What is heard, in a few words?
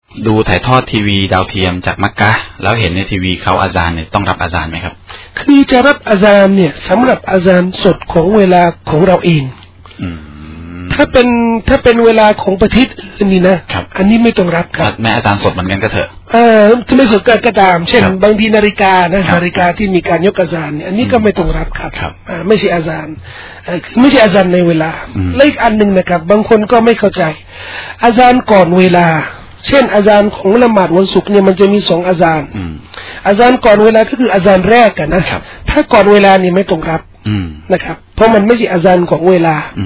azan, tv